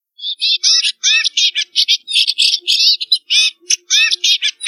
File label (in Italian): peppola schiamazzo